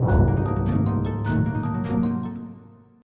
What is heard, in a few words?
Stinger; Grand Master Nemsy